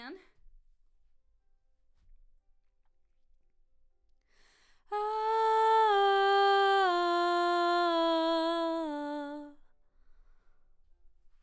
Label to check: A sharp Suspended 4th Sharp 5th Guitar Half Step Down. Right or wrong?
Wrong